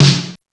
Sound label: SD1 M1, DRUMS; East West; Global Sounds